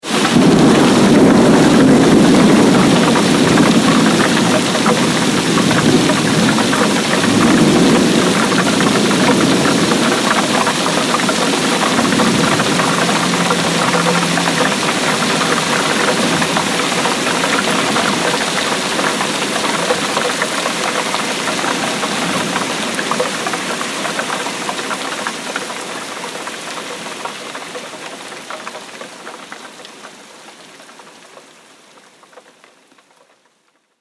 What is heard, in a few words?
Heavy Rain Storm, thunder; weather